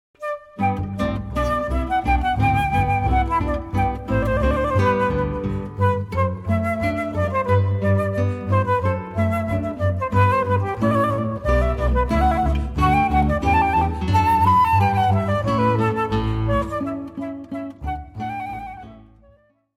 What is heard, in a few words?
Fantasia, guitar flute bass trio; Teleman